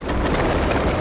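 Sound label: droideka Roll start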